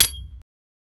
fast bass sword hit with

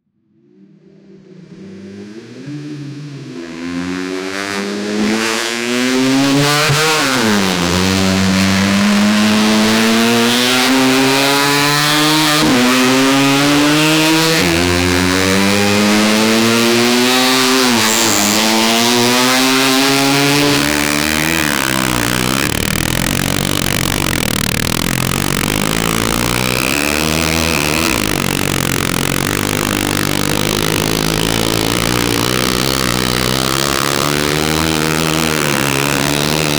a race motorbike with v4; akrapovic

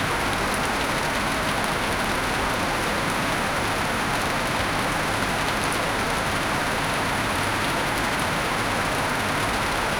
heavy steady rain on a wooden deck

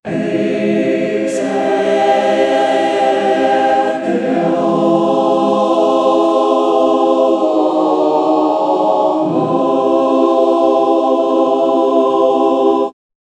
one note choir like

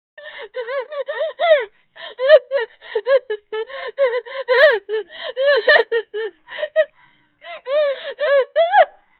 a 24 years old indian, crying

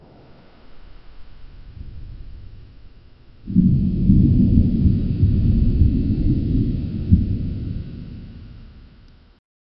haunted house ambience

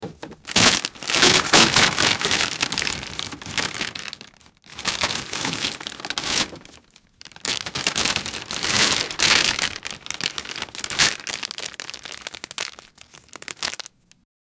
fries being thrown on a

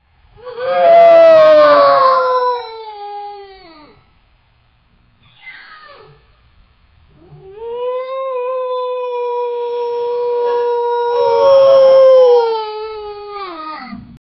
hyena howl and laughs; ambush

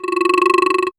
goblin; mmo spiel

